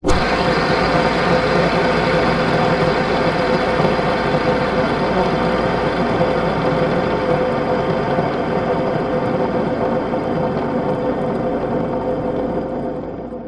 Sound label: leisure toy fidget spinner metal spin on table; zapsplat